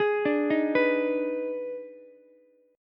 D sharp Major 7th Suspended 4th Sharp 5th D sharp Ukulele, chord; arpeggiated; 13,14,11,14; JGuitar